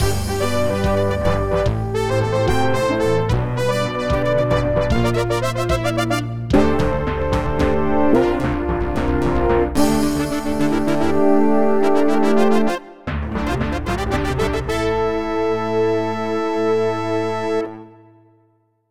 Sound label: Victory; Jagged Alliance; DOS